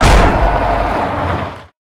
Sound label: hit tractor; Blind Drive